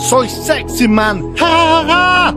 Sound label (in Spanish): sexy man jajaja